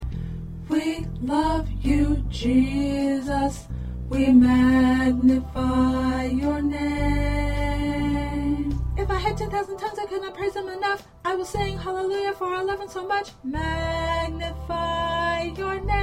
Lead Tenor

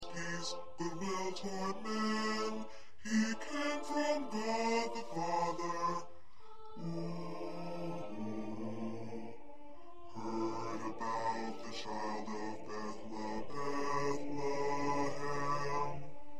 Bass

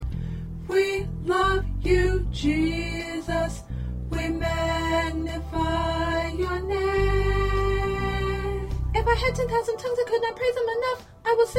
Lead Alto

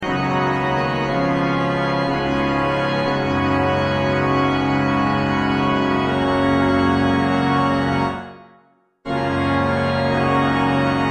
Organ

